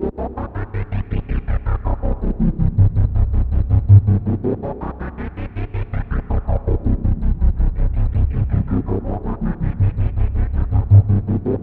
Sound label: Roland JV 2080 LFO C1; MISSION LFO